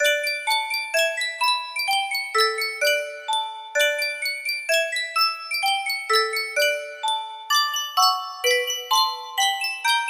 Sankyo Custom Tune Music Box

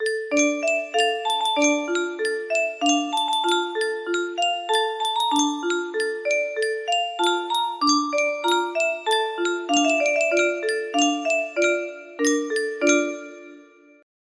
Yunsheng Music Box, music box melody

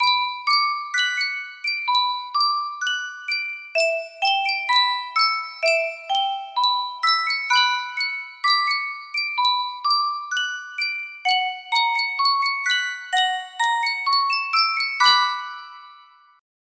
yunsheng custom tune music box

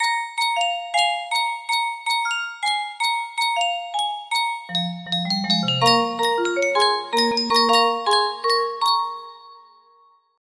instrumental, music box melody

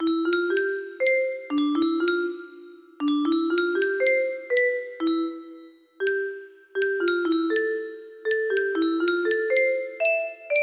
melody

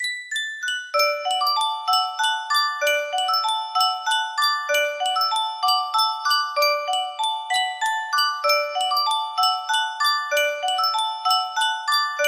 yunsheng music box

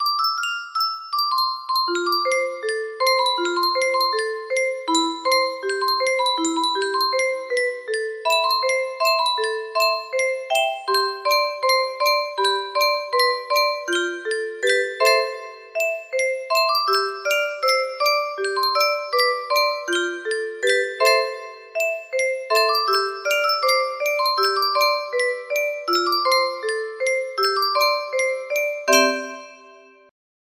melody

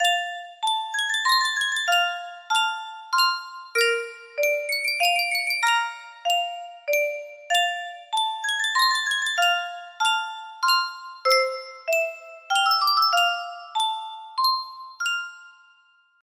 Yunsheng music box

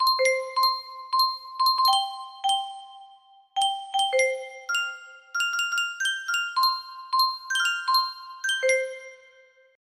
Reuge Miniature Music Box

